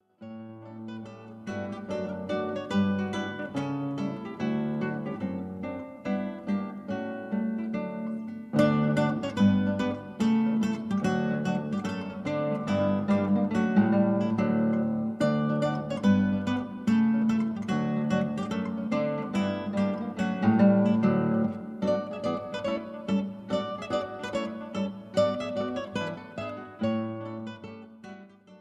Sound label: sarabande, guitar